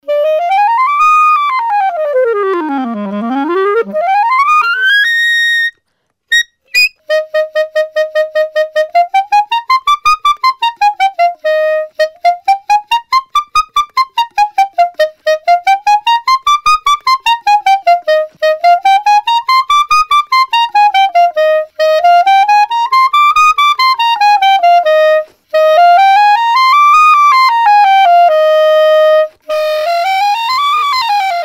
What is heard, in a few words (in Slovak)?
eb klarinet